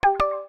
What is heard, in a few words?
alert